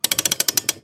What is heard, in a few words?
in hole, pinball